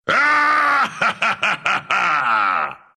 laugh, ru; Husk; Dota 2

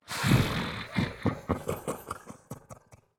Assassin Laugh; Realm Royale; Chained One

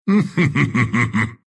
laugh, ru; Dota 2; Zuus

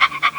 Pup pant; Frost Avalanche

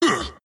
wraith grunts; skeleton king

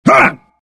ability warcry; Sven

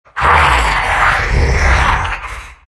big snarl; Undying

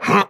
Grunt Jump

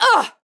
Grunt Attack; Paladins; Tyra

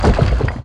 Magnetize debris

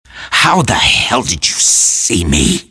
death; riki

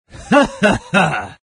laugh, zh; Dota 2

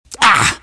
riki pain

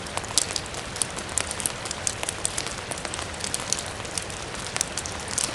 idle loop; Slark

